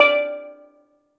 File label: Steel Drum D5